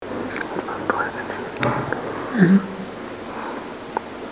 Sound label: Whisper